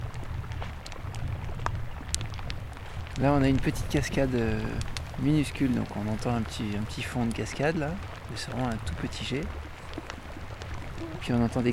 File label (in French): crepitement glacier